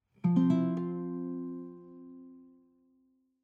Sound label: chord